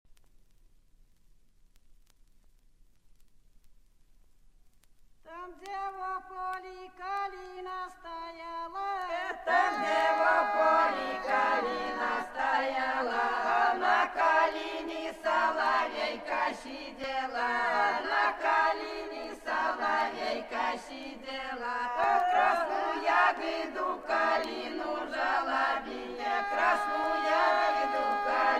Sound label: russia, polyphony